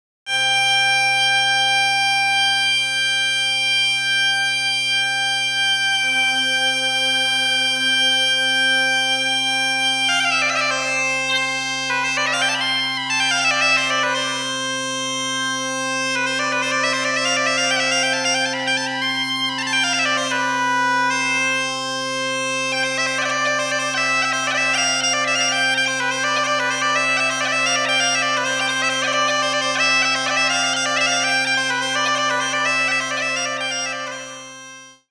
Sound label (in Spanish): Instrumentos musicales. gaita